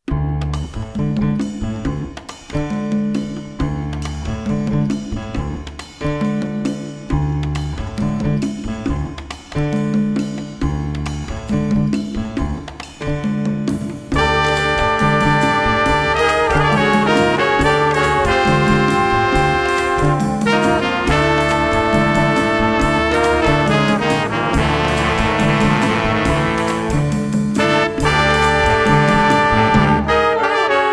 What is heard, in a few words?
Dance Of Denial 5 horn, tenor